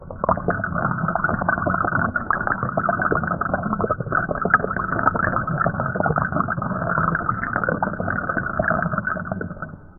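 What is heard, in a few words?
deep liquid bubbling chemical, underwater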